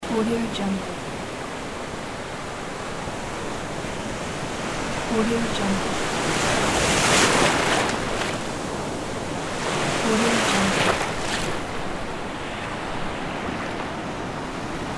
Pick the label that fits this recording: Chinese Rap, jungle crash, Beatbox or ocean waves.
ocean waves